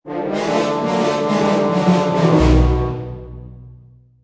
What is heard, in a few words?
Retreat Battle; heroes of might magic v gamerip 2006; Necropolis